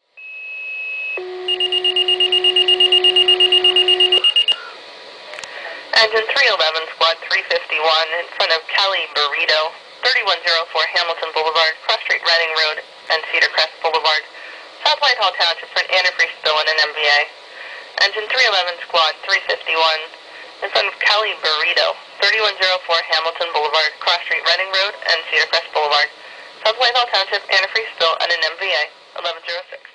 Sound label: engine dispatched to spill; engine 311 and squad 351